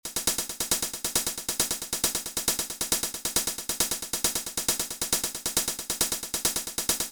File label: hihat loop